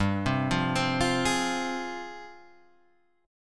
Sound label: F Minor G, Fm/G chord; arpeggiated; JGuitar